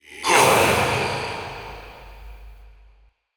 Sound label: Processed Battle Shouts, Viking Vocal